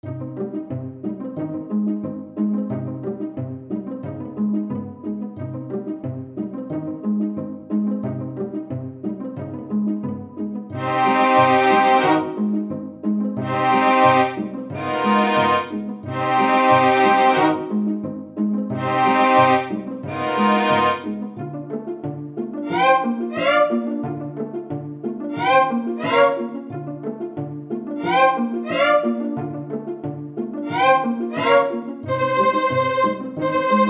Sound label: string project